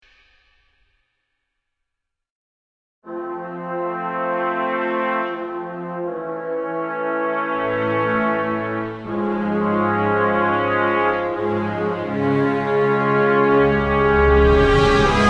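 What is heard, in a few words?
sounds of fanfares and solemn award ceremony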